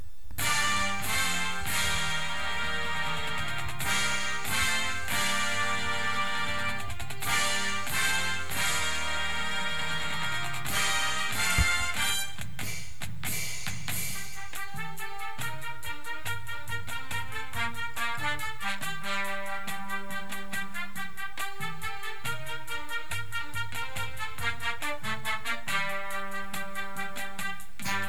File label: cymbals with music, drumline